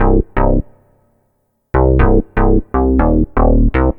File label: Muhum Bass; Sonic Foundry (Sony Creative Software); The Electronic Music Manuscript Collection; Bass Foundations